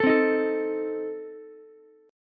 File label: A Diminished A 14,12,11,12 down Ukulele; Adim strummed; JGuitar